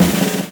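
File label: drum roll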